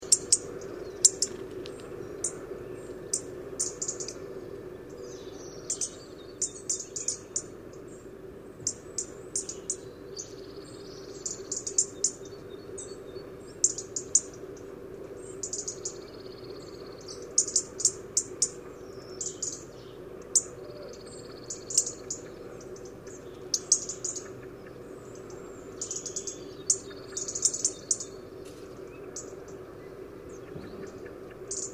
Erithacus rubecula; SETE

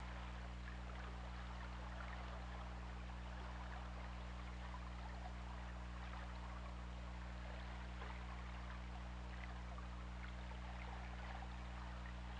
Orca; OrcaLab

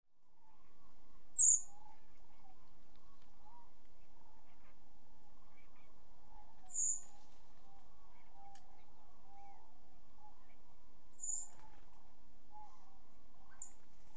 Turdus merula; SETE

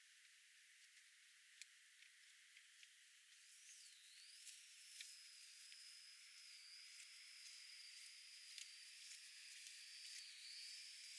DRONES

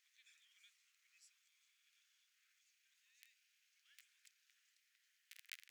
DRONES

